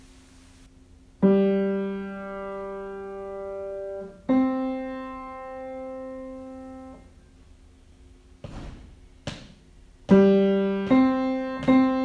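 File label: alto sax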